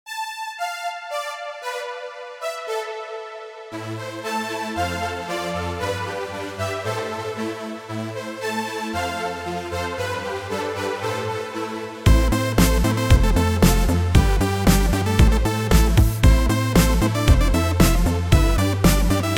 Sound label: Italo Disco